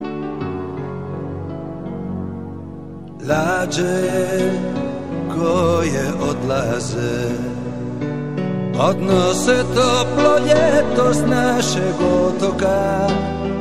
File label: romantic croatian